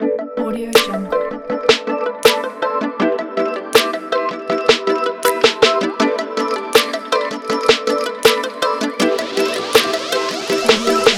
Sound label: background hiphop beat